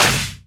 clap sample f sharp key, Royality free clap sound clip tuned to the F# note. Loudest frequency: 3320Hz; single hits one shots